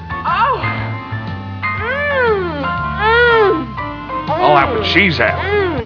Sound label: groan; JENNY MCCARTHY SOUNDS